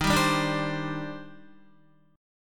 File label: D sharp Major 13th, down, Guitar; D#M13 strummed